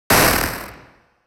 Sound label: jacobs ladder laser; dogma